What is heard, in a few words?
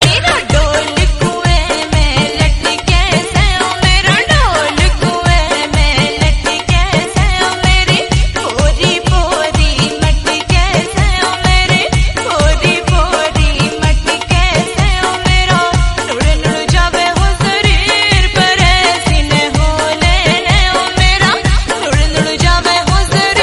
haryanvi dhol, ringtone